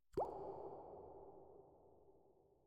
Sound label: Water Drops Single; Inquisitor 3